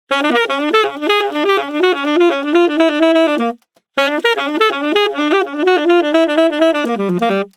Sax Solo; Disco House

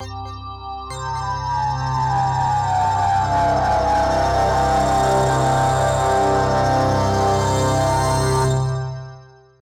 Burning, пэды

